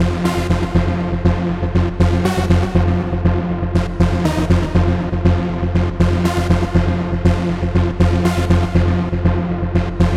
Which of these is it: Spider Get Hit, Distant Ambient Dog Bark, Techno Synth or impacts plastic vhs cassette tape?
Techno Synth